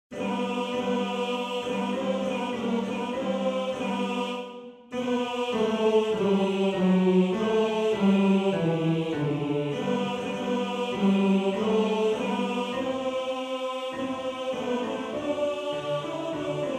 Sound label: Tenor; Quel Mazzolin; Tenor 2